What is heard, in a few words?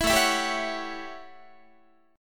A 13th A down Guitar; A13 strummed